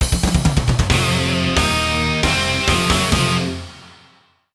Touchdown; NFL Rush Zone; Leapster Explorer

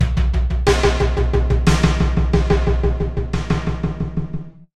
90 bpm beats

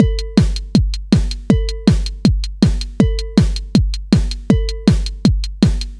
4 bars 160bpm; Blade Kitten Episode 2

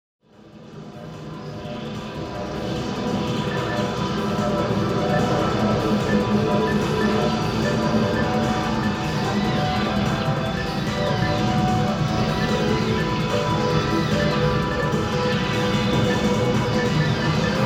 Arcade background noise -Tokyo's Akihabara; Ray'z Music Live; Strahl Audio Visual File

